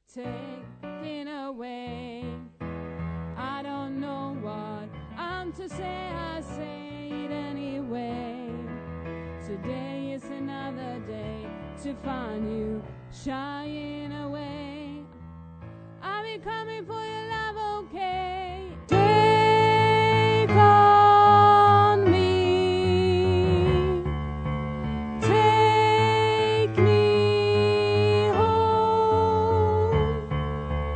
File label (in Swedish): bas, stammor